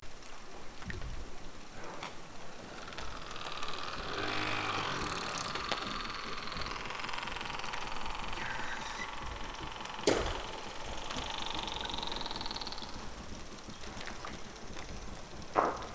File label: Orcas boat noise Lofoten; Heike Vester; Ocean Sounds